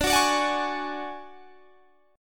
D Major 7th Flat 5th D down, chord; DM7b5 strummed

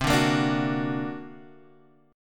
D Augmented 7th C, guitar

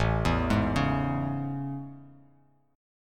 A Major 7th A; AM7; arpeggiated; JGuitar

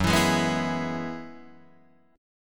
Gb 9th Suspended 4th Gb, Guitar Drop D

